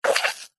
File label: hospital syringe squirt gel medicine into plastic container